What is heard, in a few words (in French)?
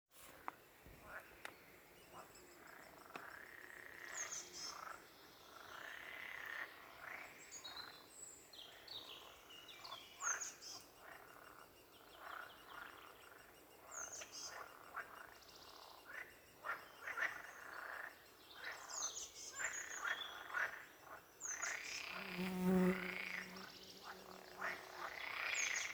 Grenouilles et oiseaux